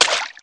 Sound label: player slosh; xash3d fwgs